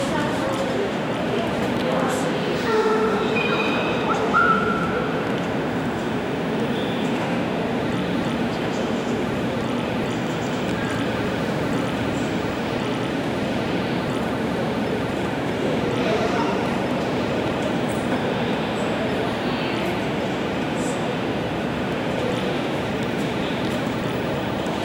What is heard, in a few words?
airport exterior parkplac